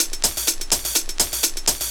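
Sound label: drum loop 125, hh+filterloops; Vocal House; PS-28 Vocal House Samples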